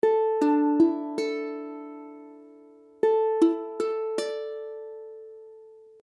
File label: Dsus2, ukulele; ukulele akordy